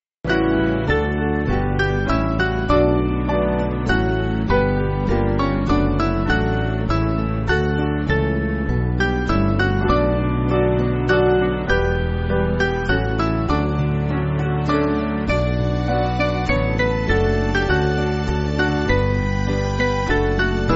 Band, church music

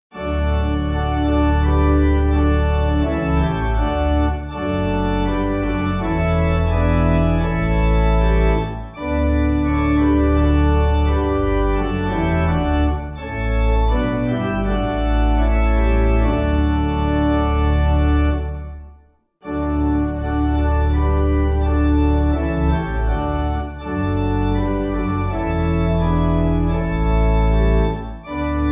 Downs Pipe; As When The Hebrew

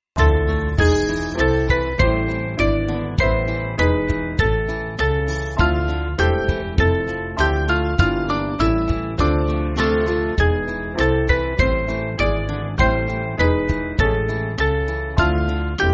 Band; Christ Jesus Lay; Todesbanded; Bach; 4/Dm Bach Harmony (approximately)

